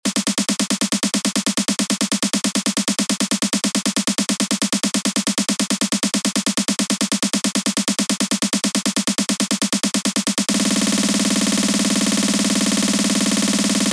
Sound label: Snare Fills Trance